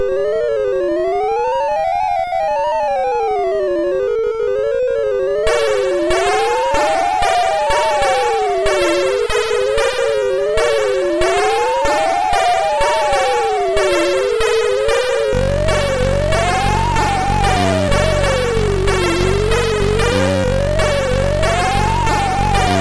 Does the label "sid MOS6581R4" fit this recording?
Yes